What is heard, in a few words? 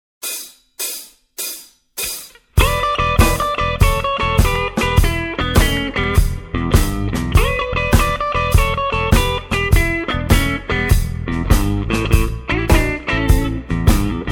blues jazz